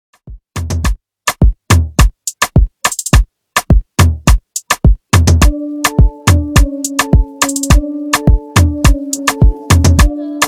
Afrobeat

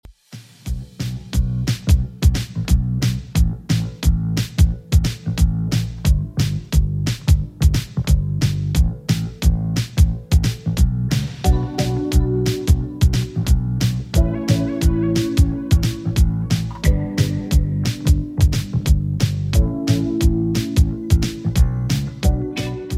80s Rock Redrum 178bpm; remixplanet

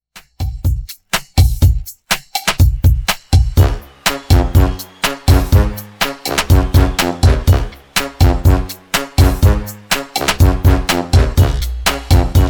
123Bpm; Redrum

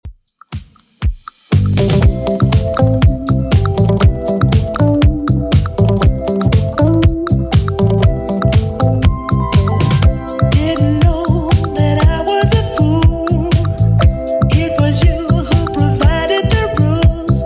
80s Redrum 120bpm

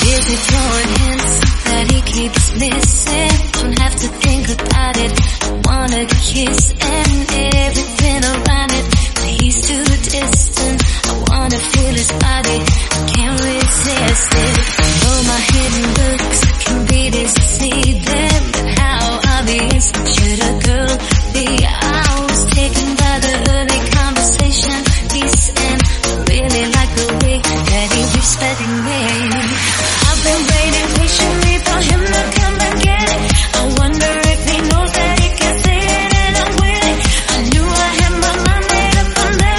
128bpm Eb

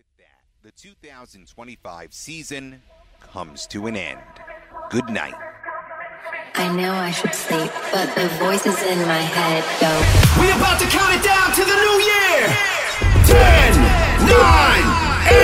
NYE Countdown

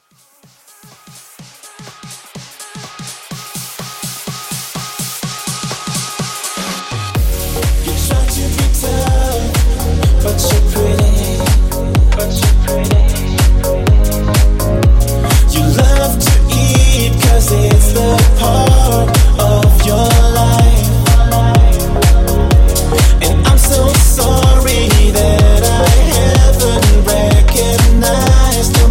Dance House 125 Bpm

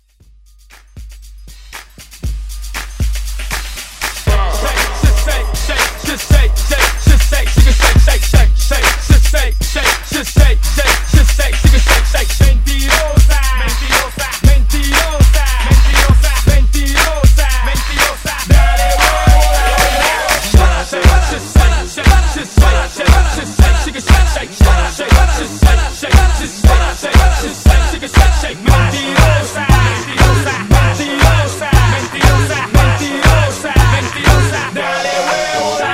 Throwback Redrum

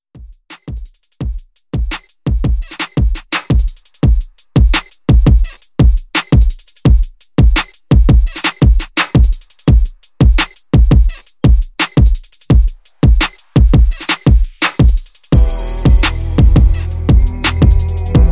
Hip Hop; Redrum

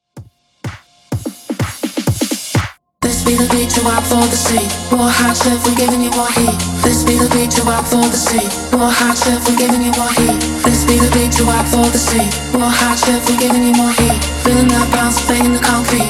Club Mixshow Short Edit 126 BPM

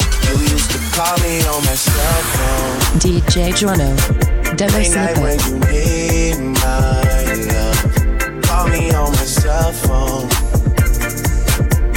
128bpm F

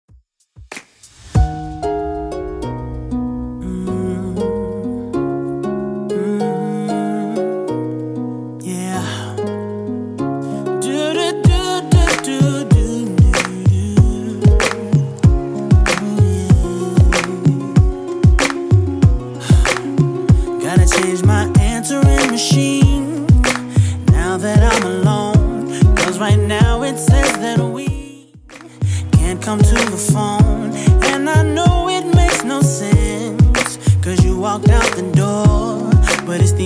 Rnb DJ Friendly Redrum 95bpm

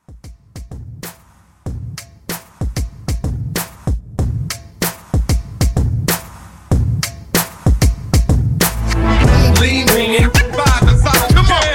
Hype Scratch Re Drum CLEAN

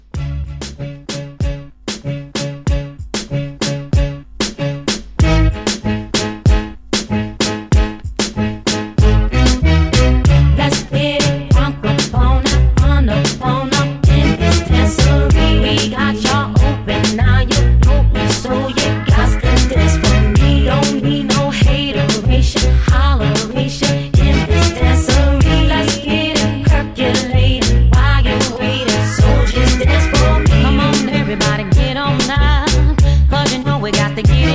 Dancehall 95

